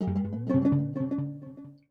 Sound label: ethno 125